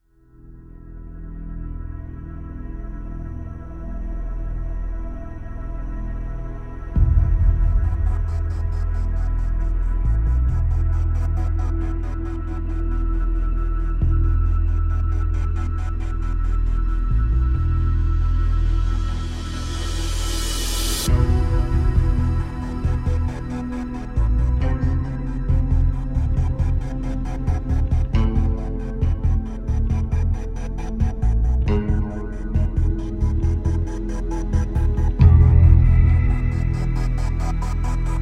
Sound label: no perc